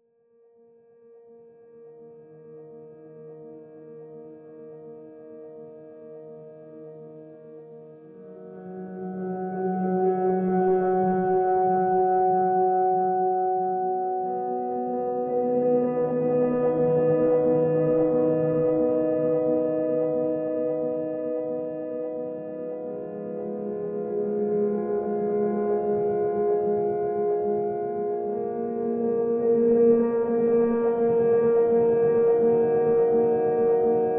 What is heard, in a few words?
CORPORATE; musique a l'image